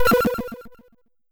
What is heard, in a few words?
Strange, Magic & Skill; TowerDefenseGame